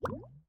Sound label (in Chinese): 滴水石锥熔岩滴落声